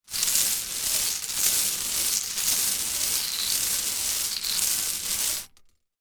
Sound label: ball roll, bingo; general bingo